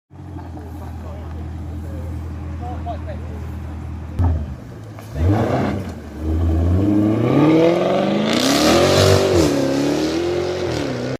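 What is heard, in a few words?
Jaguar, exhaust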